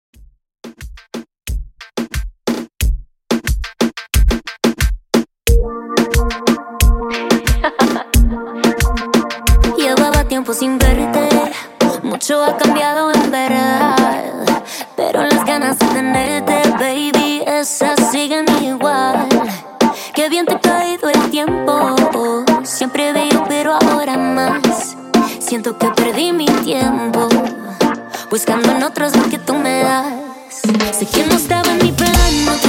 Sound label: Reggaeton 90 Bpm